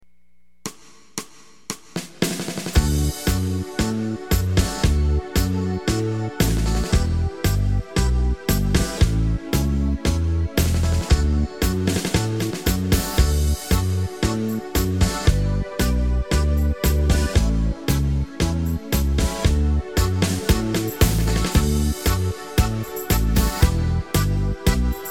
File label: tango; yamaha